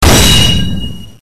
all clear

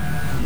scrape; madcars2; radicalplay

